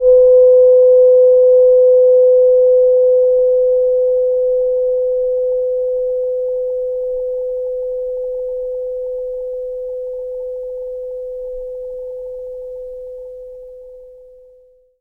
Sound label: chime